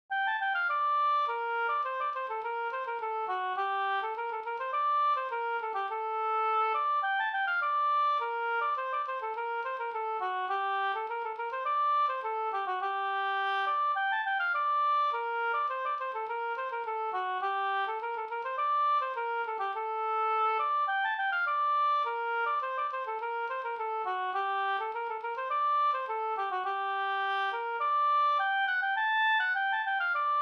polska Brudpolska

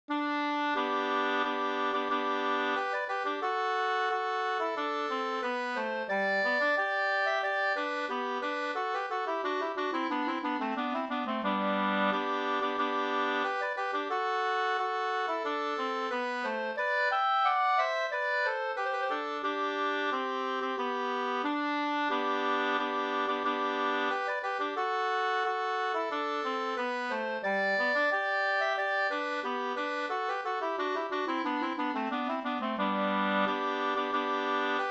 brudmarsch; Vastermo brudmarsch

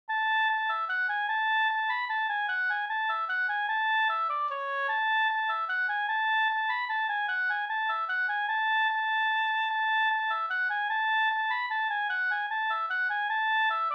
langdans; Svangrumpa Den lange dansen

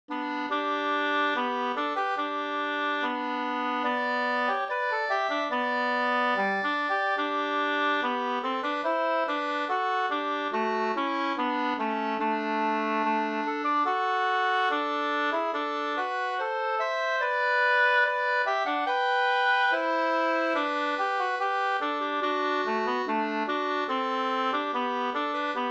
brudmarsch; av Spel-Arvid

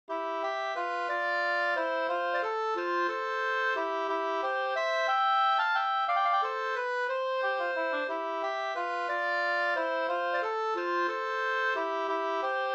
vals, C dur

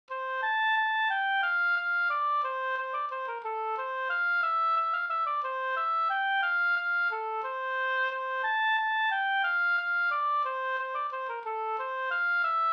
vals

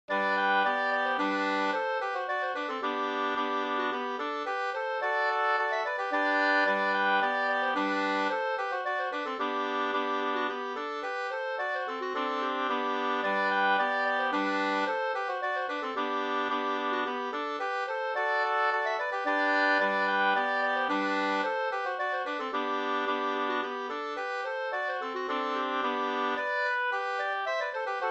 slangpolska 2st

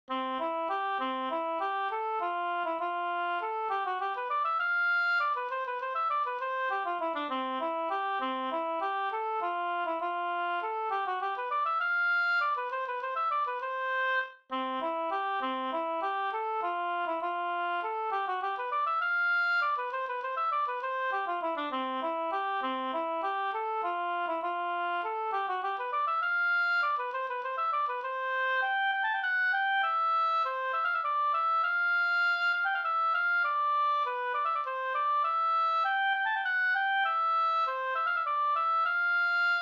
vals, C dur

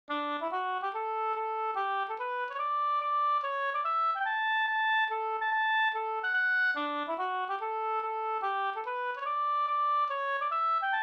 reinlender; fr Skillingmark

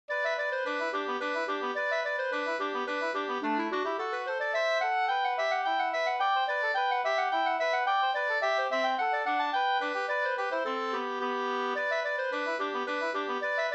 slangpolska

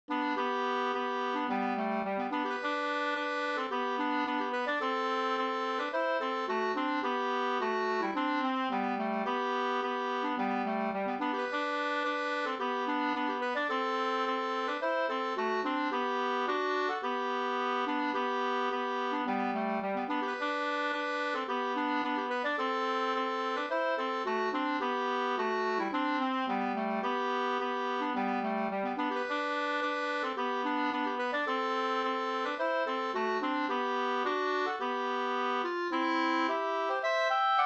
ganglat, 2st; Festmarsch; G Orre

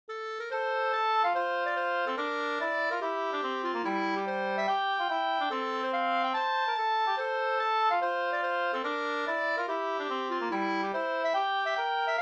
schottis; Gronsinka; Smess Nisse Eriksson

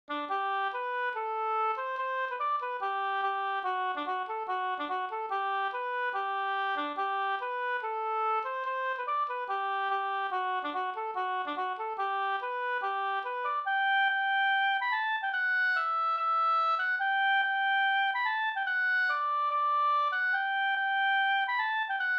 reinlender; e Per Midstigen no

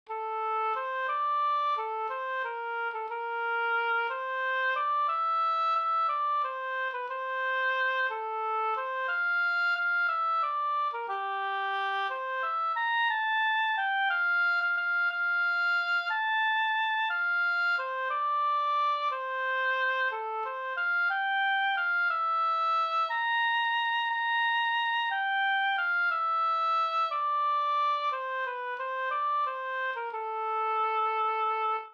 vals familjevals F dur

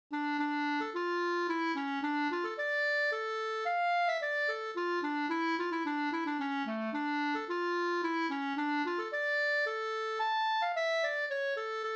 polska senpolska; Murklan; Carl Pettersson Mp

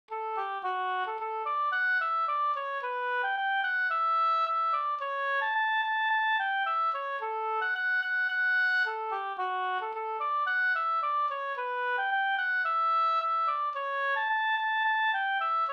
ganglat; Ronnakra ganglat Sm

